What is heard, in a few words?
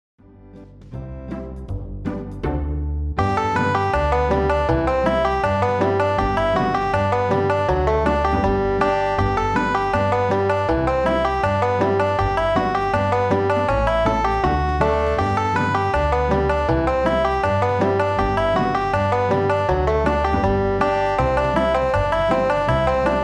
A Banjo Solo on the 5-string banjo